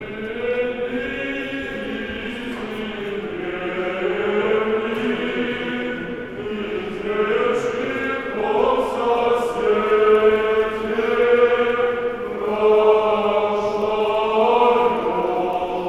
Богоявление Ирмос 2 творца 3 песни; Елицы древних

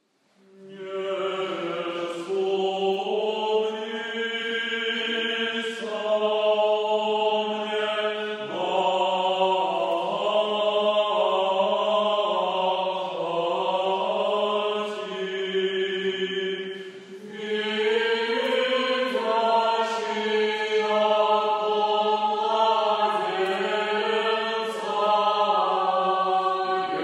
Новосибирский старообрядческий молодежный хор, Ирмос 9; Не сумнися о мне Мати

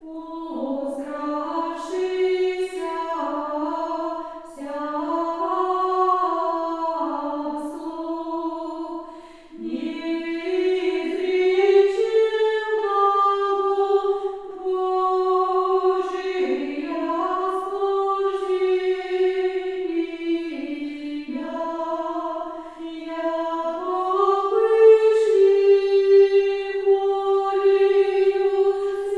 Преображение. Ирмос 9 песни второго творца

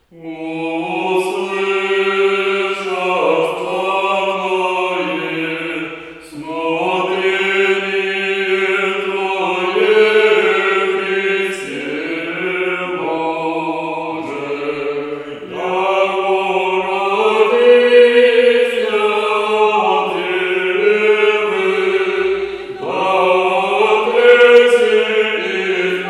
Преображение. Ирмос 4 песни; Услышах славное

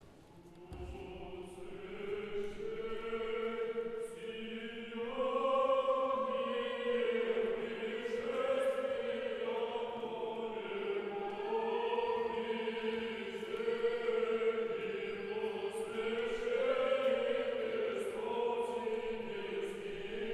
Рогожский хор 5 глас ирмос 6 песни

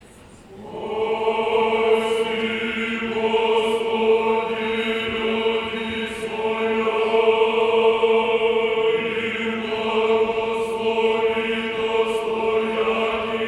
Рогожский мужской хор; Спаси Господи люди своя; Тропарь Воздвижению

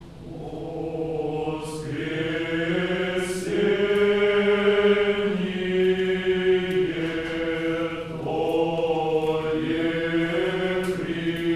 Казань. Концерт в Благовещенском Соборе. Пасха. Воскресение Твое Христе Спасе. Стихе

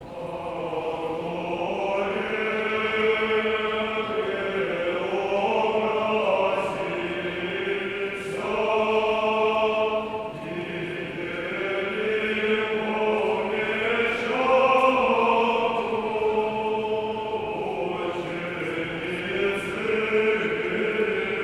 Хор г. Ростов на Дону. Вечер Духовных Песнопений, Кондак Преображению; Вечер Духовных Песнопений Жен-Мироносиц 2016

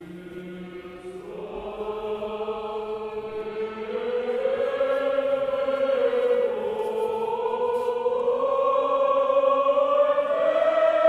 Рогожский хор 5 глас ирмос 6 песни

